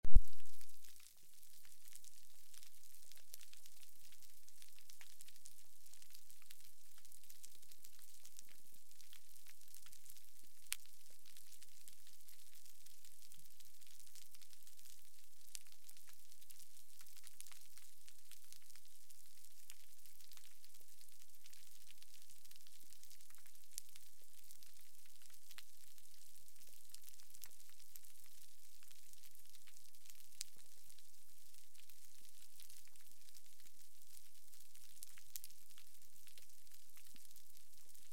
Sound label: Reef, Soundscapes of Coral Reefs; Reef B